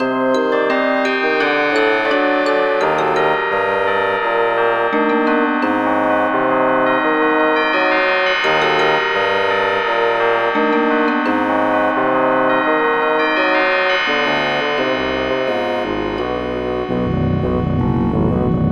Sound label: Spooky